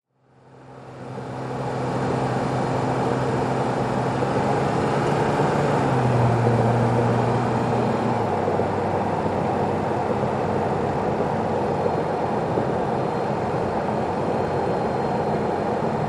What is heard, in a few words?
SUV At 55 65 Mph Slows